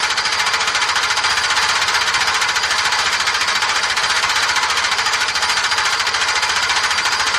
Roto Mower Slower

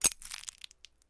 Flash Bulb Pops Poofs

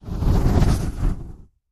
Fire Fast Torch By; torches

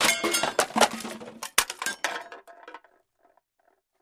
Junk Debris Spill

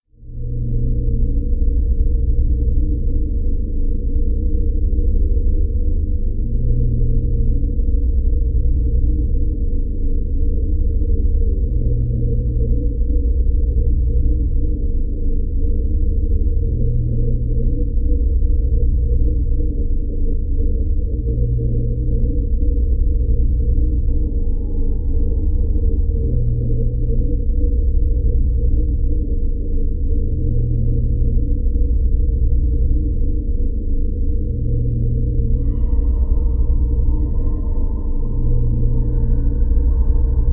Breath Of Deep Slow P, deep

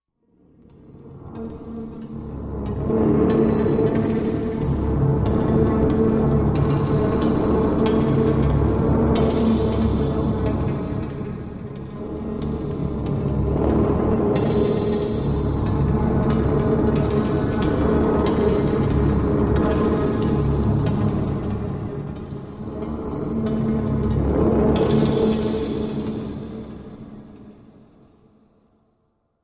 Machine, Buzzing Drone, Ticking, Waves; Petulance Counter

